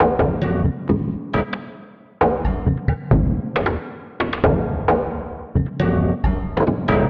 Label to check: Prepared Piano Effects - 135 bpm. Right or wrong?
Right